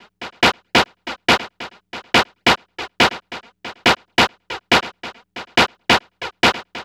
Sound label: NRG Percussion, Trance Drums; Sonic Foundry (Sony Creative Software); Trance NRG VOL-1